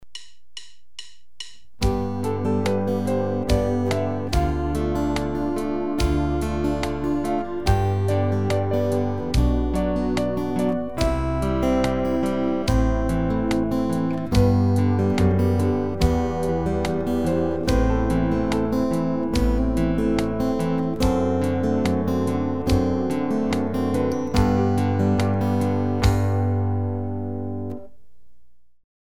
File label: All My Trials, guitar